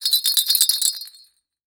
bell jingle

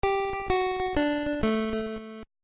Melody 4 (Piano); NES; mother earthbound zero

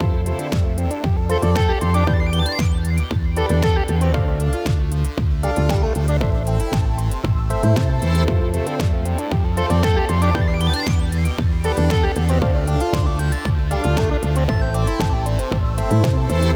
Music Settings; sayonara wild hearts 2019 gamerip

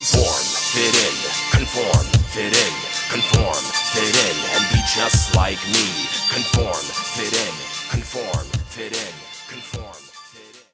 Stinger; Hoops

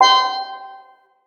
Drum Puzzle; escape academy; Escape From Anti-Escape Island

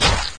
Gib Drop

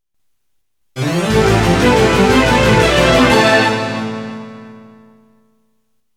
Game Over; Reflex; Type B

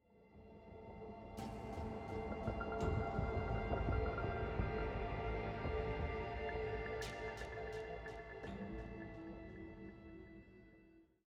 Military High 85bpm; Legends of Pegasus; windows gamerip 2012

